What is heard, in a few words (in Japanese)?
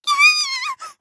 "きゃああああ！", ロリ; ハイグレボイス集